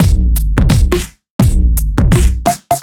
Duo Swing Mix, 85bpm; off the grid

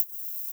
Hhat, Hihat; Equipped Music; Loungin House

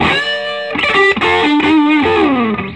SOLO, Vintage Blues Guitar; USB Soundscan vol.22

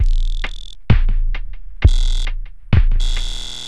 Octave Down Loop 130BPM, DrumLoop Ghost Layers

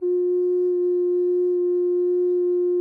GEMSHORN TEN F#; SoundBytes - Early Patches

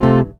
CHAP CHORD, Chapman stick; Greytsounds

